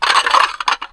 Plate Underlay Play

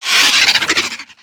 Twilight Whelp Attack

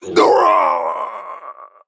Male Human Death

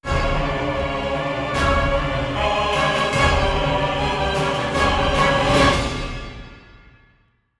Dragonlord, Play Stinger

